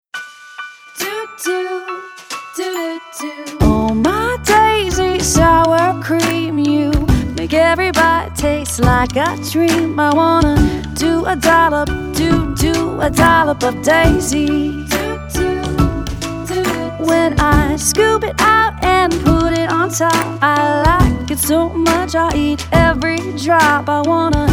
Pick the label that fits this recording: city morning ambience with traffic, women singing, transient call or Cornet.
women singing